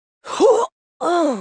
Woman Fall; voice; Zomboid; Lifestyle

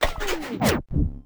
dj booth turn off; Zomboid; Lifestyle

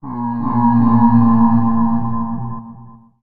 moan; Zomboid; Random Sound Events; Stalker

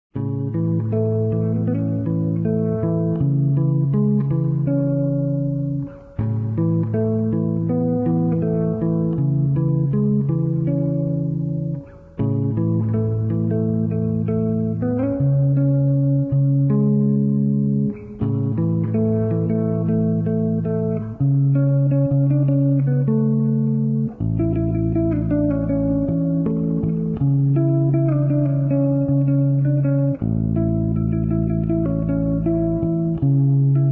Guitar Electric Bass; Zomboid; Lifestyle

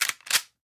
Lever Rack; Zomboid; VFE

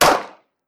Firearm 9mm Shot; Firearms; Zomboid

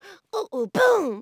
Woman Dance Happy; Zomboid; Lifestyle

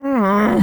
Woman Frustrated; voice; Zomboid; Lifestyle

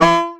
Saxophone; Zomboid; Lifestyle

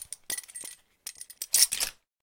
Equip Crafting; Zomboid; The Workshop

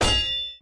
Long Blade Floor; Scrap Weapons; Zomboid

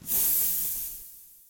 Pump Deflate; Zomboid; P4PumpPury

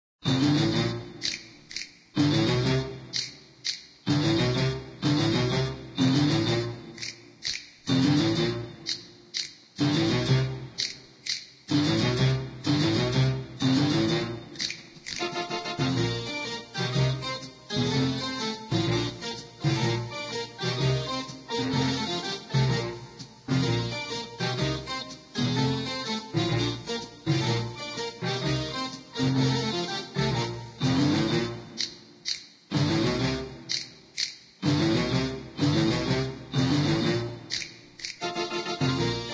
Keytar; Zomboid; Lifestyle

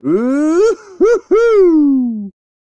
Man Woohoo; voice; Zomboid; Lifestyle

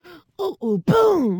Woman Dance Happy; Zomboid; Lifestyle

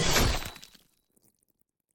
Blade; Zomboid

